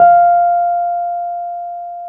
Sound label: PNO, Wurly